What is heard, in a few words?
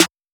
Snare